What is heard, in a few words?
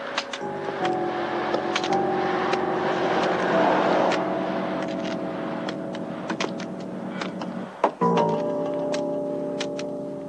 wet loop